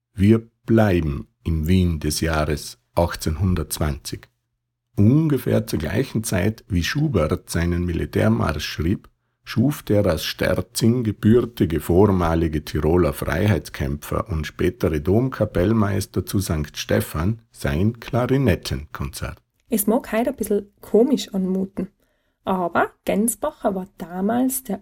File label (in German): Klarinettenkonzert; Gänsbacher